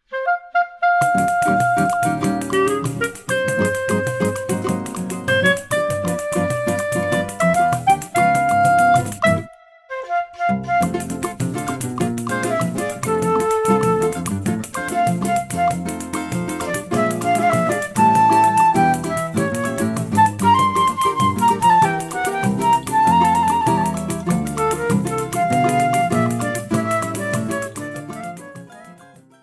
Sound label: songbook instrumental